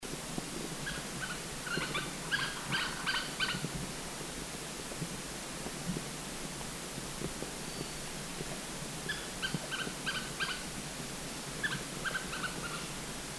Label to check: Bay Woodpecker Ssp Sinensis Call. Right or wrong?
Right